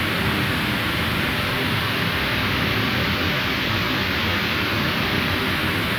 Panned Noise, non tempo loops; stereo toolkit samples

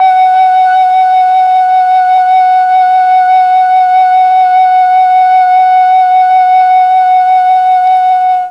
F#3 TRON FLUTE, Mellotron; Mike Pinder - Mellotron Archive CD

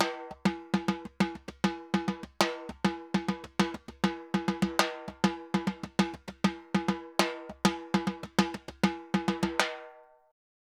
Timba Bomba 100, Drums & Percussion, 100 bpm; Best Service; Festa Latina VOL-1

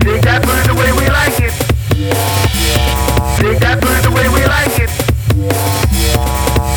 Shake That Booty 142 Dmin, Hardcore Breakbeat; Big Fish Audio; Hardcore Breakbeat Action

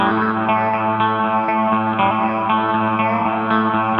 Gretsch Ring 120 A, guitar drone; 120bpm

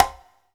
Bongo E, Pecussion Hits; Zero-G Jungle Warfare 1, 2 & 3; 90 s Sample CDs

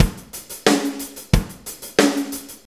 90 ROCK, DRUMS

